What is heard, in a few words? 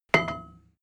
metal tool drop massive; soundpool